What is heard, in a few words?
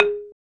KULINTANG A4; Spectrasonics; Heart of Asia; KULINGTANG